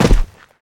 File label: land sand, footsteps; player